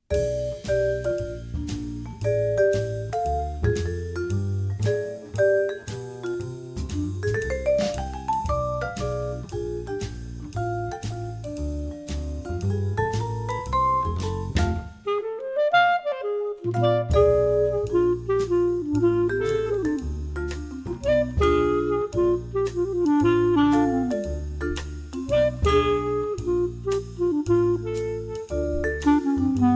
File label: smooth